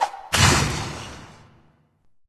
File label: Lava splash; Level5 Gollum